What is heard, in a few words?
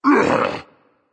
grunt hit berserk; cuchulainn